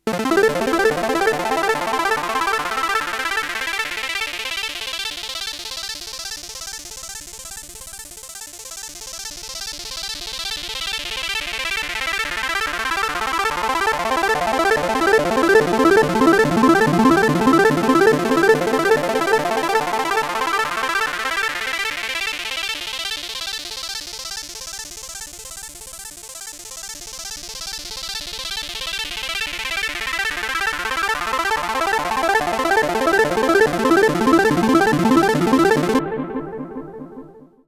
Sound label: matrixbrute2 arp